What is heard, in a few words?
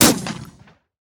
pump shotgun; fight